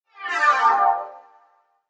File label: Scifi; Scifi computer interface musical tone; Sound Design; Michael Huang